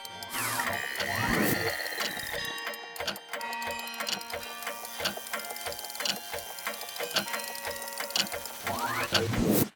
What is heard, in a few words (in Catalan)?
campanades campanes